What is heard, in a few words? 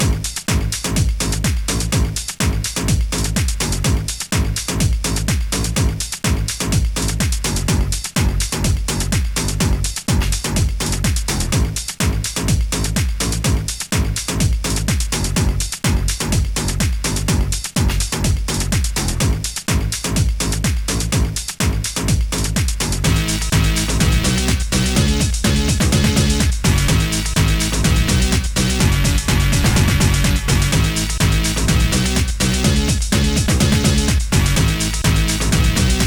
mod techno; techno bable